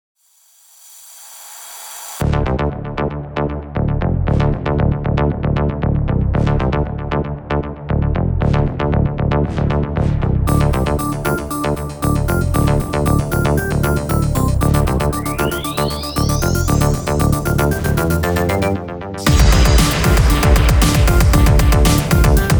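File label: Bass for car, car audio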